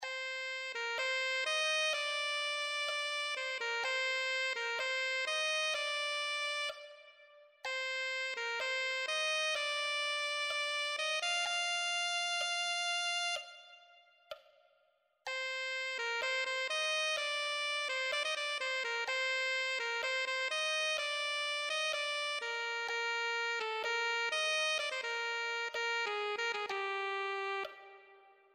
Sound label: alto saxophone